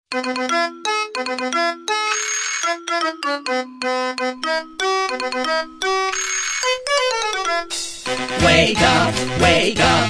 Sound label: La Cucaracha Wake Up